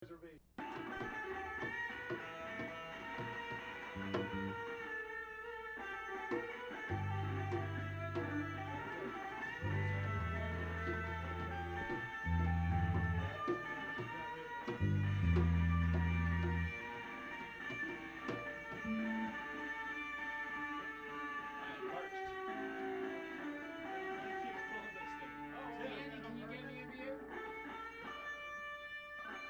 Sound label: floating on waves of distortion and reverb